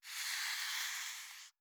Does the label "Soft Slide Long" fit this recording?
Yes